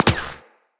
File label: Shield Impact; Tenno